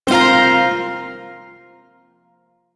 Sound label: Shawzin Hirajoshi Chord I; Warframe; Duviri Shawzin